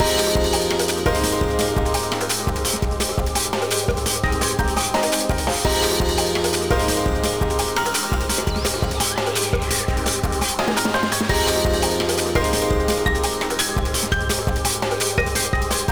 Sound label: Bgm